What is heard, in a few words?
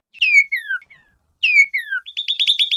Northern Cardinal